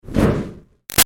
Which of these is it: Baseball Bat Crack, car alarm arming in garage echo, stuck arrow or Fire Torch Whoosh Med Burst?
Fire Torch Whoosh Med Burst